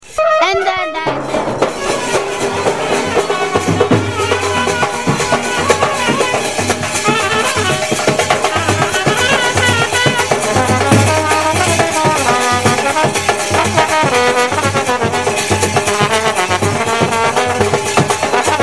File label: drum horn quintet, Field Recordings from India; WFMU